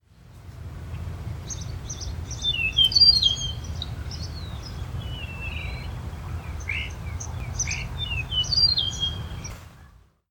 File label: Eastern Meadowlark